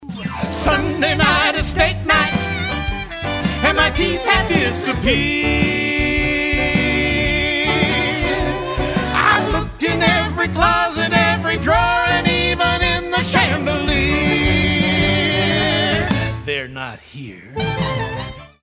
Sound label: blues